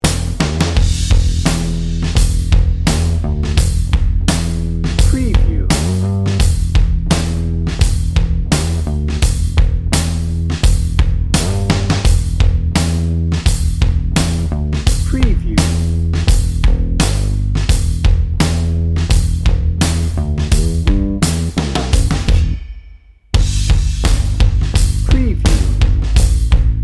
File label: snake river dnb